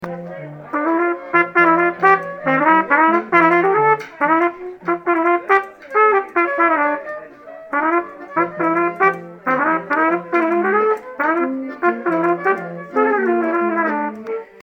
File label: polka melody b part